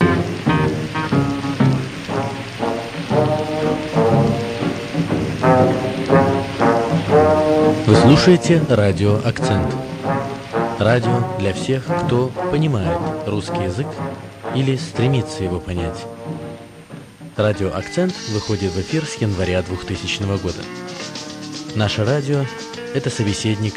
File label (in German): Jingle